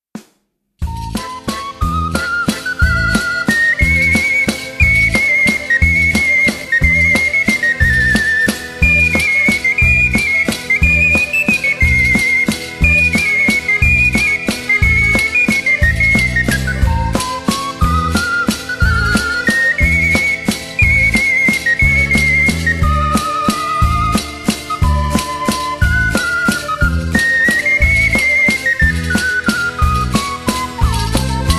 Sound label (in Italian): Valzer